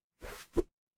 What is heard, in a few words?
transition swoosh